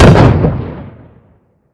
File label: Vehicle Weapons; Red Orchestra 41-45